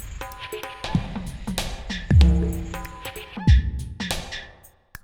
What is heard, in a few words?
Deep Mover 95